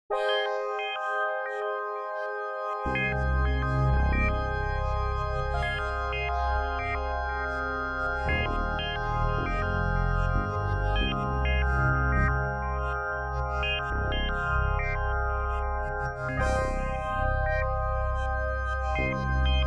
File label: Yamaha Montage 8 FM